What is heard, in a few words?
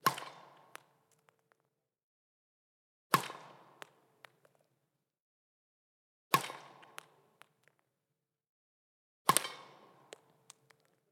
badminton hits hard; ударов ракеткой по воланчику